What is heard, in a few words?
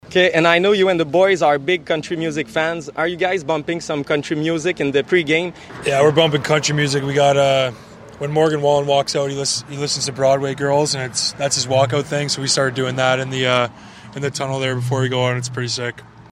country music